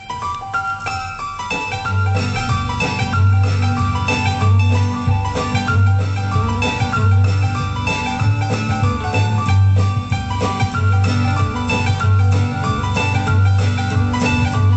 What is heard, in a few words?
cajun on the keys